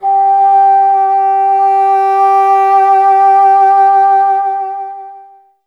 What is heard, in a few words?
bassoon; Miroslav Vitous - Solo Instruments VOL-1